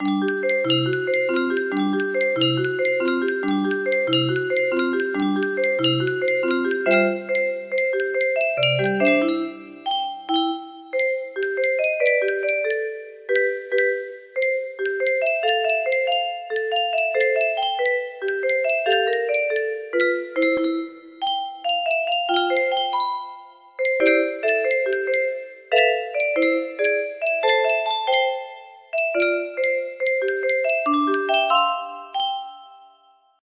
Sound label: melody, music box